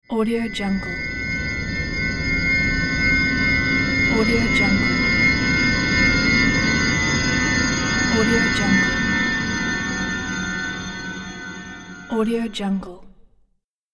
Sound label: magical space journey transition